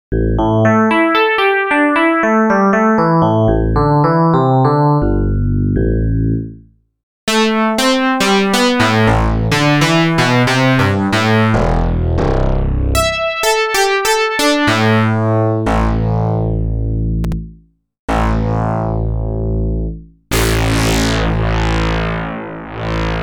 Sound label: yamaha ex5r, sounds, selffm, dx7s raw and selffm